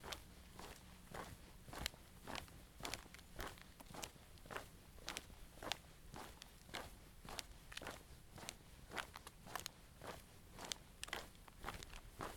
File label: Walking Asphalt